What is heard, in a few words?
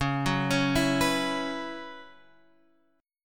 Gb 7th Suspended 4th Db, chord